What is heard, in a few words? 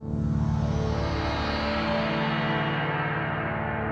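122 BPM Pads C major A minor Tonic D, ambient synth pads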